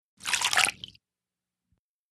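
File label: Small Liquid Spill Water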